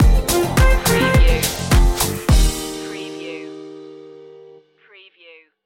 island beats stinger

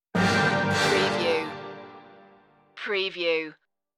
swift wings stinger

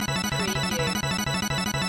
Casino Slot Machine Winning Count Loop

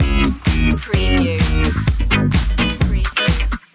disco loopy loop

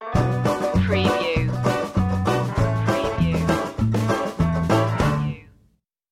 cleos jig stinger

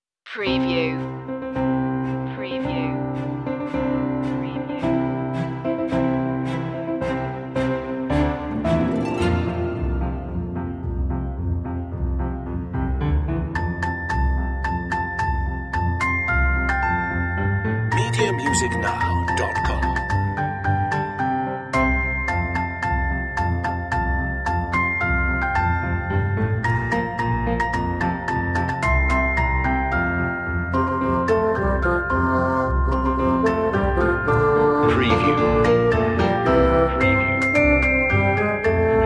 jingle bells orchestral; media music now

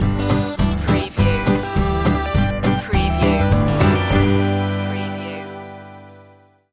prairie dog two step stinger

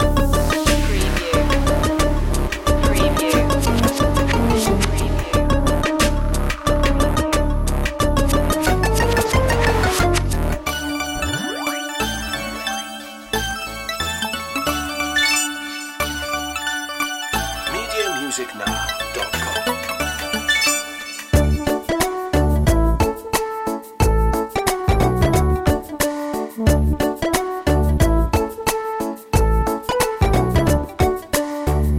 retro video game labyrinth loop

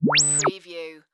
Hit Digital; Production Element; Sound Effects Bible

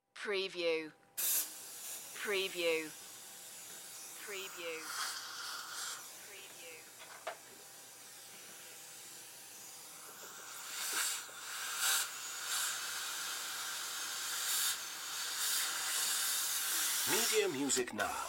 Dentist Drill Sequence

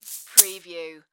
Marbles Bag Set Down; sound-effects-bible

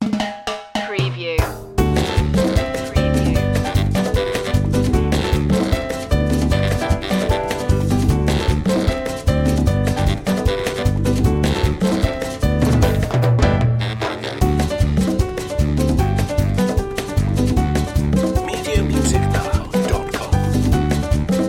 merengue underscore

